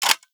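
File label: sg556 bolt forward, gun; arccw go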